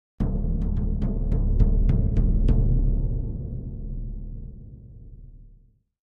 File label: Drums Toms D Deep Acce